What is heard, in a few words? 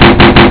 bang